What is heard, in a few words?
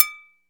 CAMBRA DINK, AFRICAN PERC; Greytsounds VOL-1